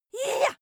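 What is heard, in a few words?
female attack yah, screams; schreie; menschen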